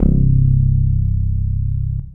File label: TG-33 Bass C3